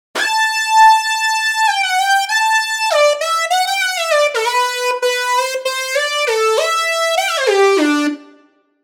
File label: solo; obx